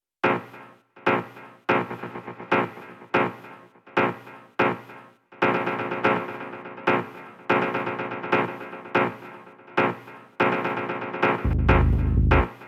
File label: beatprogramming